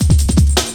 Drum Loops, 158.5; Jungle

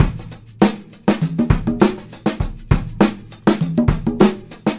Combo, R&B Drums

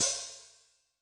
HiHat, Yamaha DTXpress-II

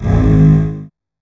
DOUBLE BASS

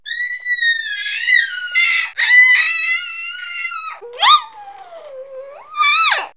X-ited Baby, Comedy, vox; vox mix; X-Static Goldmine

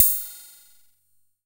KR55 CYMBAL; INIS - Funky Xtreams; KR55 KIT

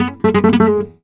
Flamenco Guitar Picado, e; Best Service ProSamples vol.46 - Flamenco

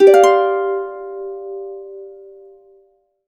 HC G MIN 11, Harps; USB Soundscan vol.62; AKAI

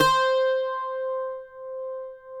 CUATRO; Spectrasonics; Hans Zimmer Guitars VOL-2

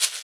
Shaker; Equipped Music; Loungin House

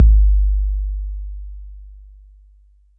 Roland 808 BD

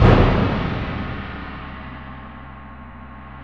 PRC GRONG, Grongkas

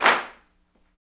CLAPS, Africa Handclaps

